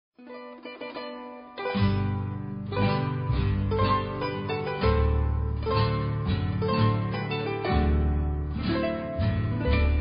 menu bgm

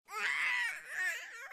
baby 9 months cry short

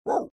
dog Woof

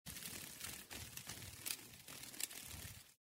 butterfly wings sound effect

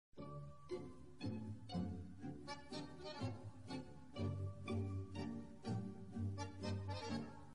uruguay tango

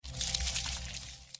ant sfx

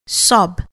sob

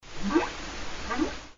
humpback whale call

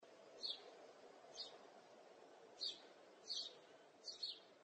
bird chirping sound effect slow

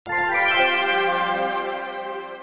ethereal fairy win sound; mixkit

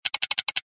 ticking

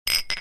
clinking glasses sound effect

